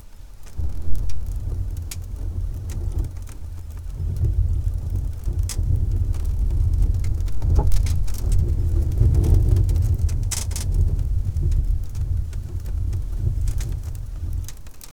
rustling of wood creak of, rural

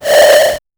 chip tone 8 bit sound

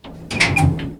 elevator door slide shut

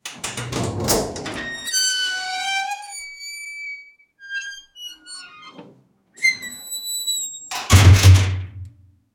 mechanical door opening, metallic scrape

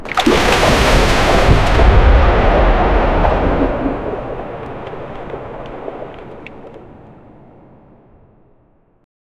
an epic underwatercoastal

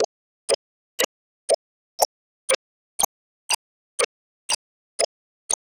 cursor movement tick subt

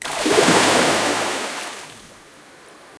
something big falls into; big impact

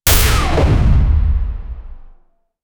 sci-fi blaster; star wars

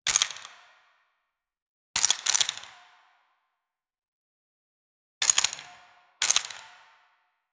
analog film camera clip

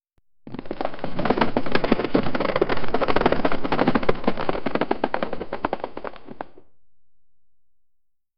detailed sound, debris

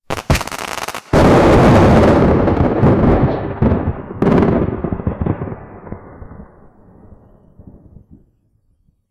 vivid comic book style so, lightning

